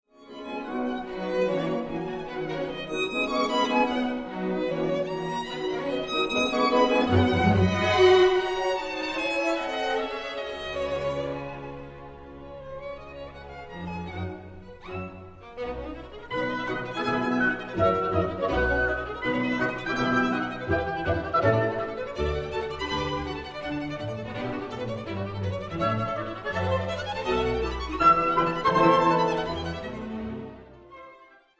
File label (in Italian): violino; Cordofoni; Il violino di Vivaldi